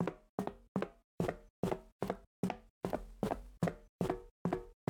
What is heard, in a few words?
walking; kenney fps; tactical shooter